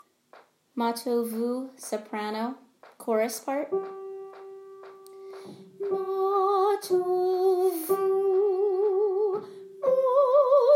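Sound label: Mahtovu Soprano; Mah Tovu 2019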